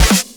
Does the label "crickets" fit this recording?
No